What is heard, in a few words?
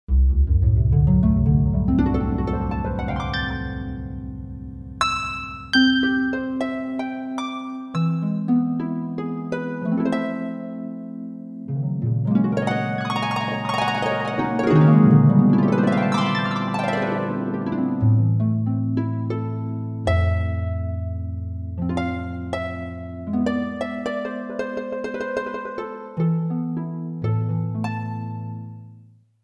Harp, Roland JV-880; Factory Patches